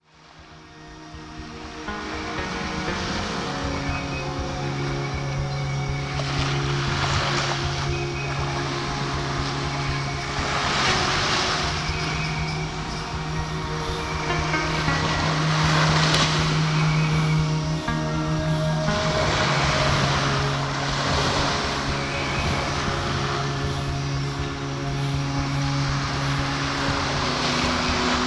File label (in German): Entspannungszeit SPA Karibisch Das türkisfabende Meer, SPA-Entspannung mit Naturgeräuschen